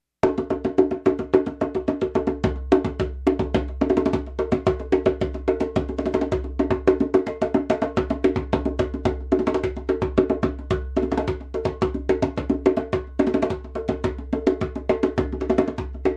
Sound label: tall drum